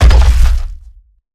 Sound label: Jumpland Walk Run; Evolve; Behemoth